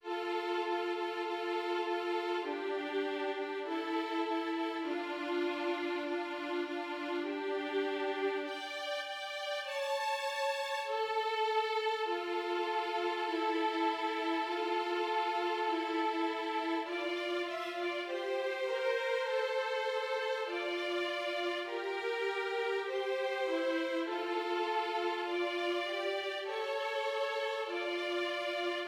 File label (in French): VIOLONS